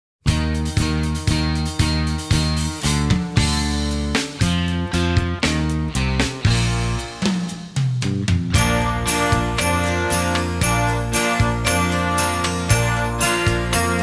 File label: jingle bells full band